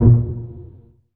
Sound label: DOUBLE BASS